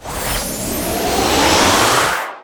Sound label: Item Enchant Effect Sound